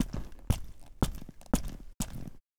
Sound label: concrete walk wet